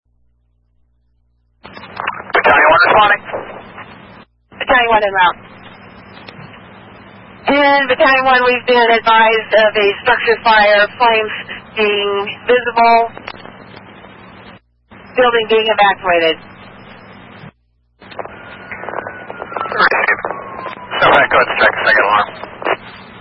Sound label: alarm